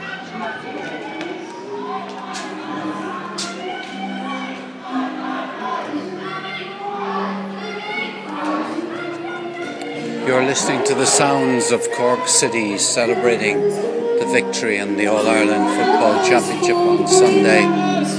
cork celebrates a famous victory sounds of the city